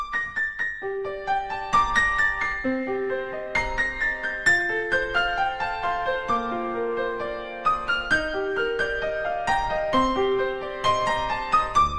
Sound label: Piano